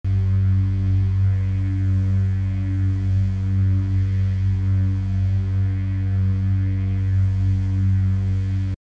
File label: lightsaber electrical loop